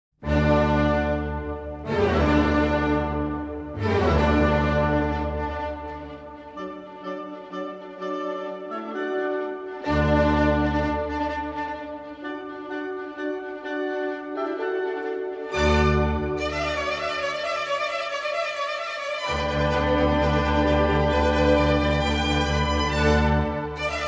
Serenade No. 7 in D Major, K. 250 I. Allegro maestoso - Allegro molto; Haffner; Mozart, Wolfgang Amadeus